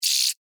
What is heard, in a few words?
Insect Voice Anger, Rattle, Vocalization, Bug; Animal; BlastWave